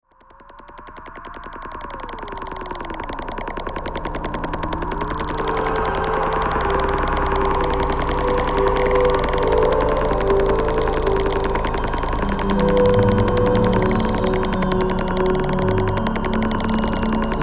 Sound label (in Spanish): musica electroacustica espanola